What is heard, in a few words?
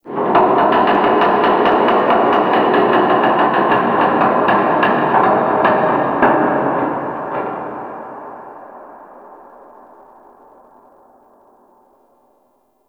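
Ice Metal Distant, Ambience; Warframe; Corpus Ice Planet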